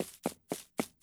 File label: Fat Run Loop